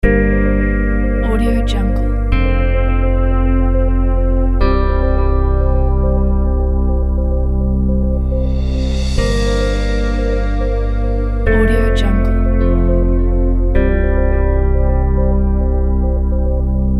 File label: space universe